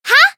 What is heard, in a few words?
Voice attack; thillelille